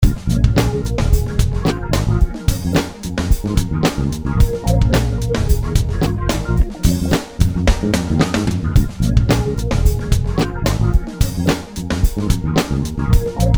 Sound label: electro lounge